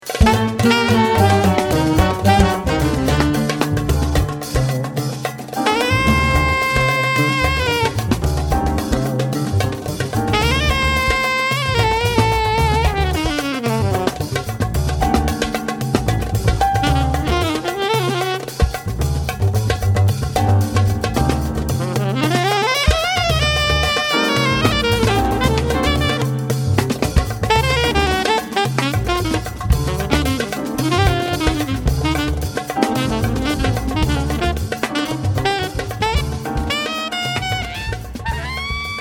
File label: Solo